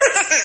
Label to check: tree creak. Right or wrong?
Wrong